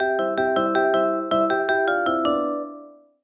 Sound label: dreidel; hanukkah